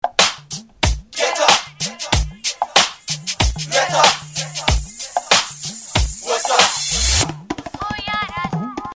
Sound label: Beats